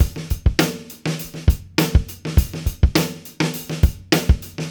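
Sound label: 102 bpm, beat loops; Zero-G; Monster Beats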